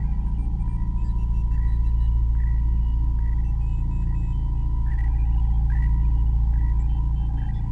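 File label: room medium telemetry ambience; Star Wars Obi-Wan; XBOX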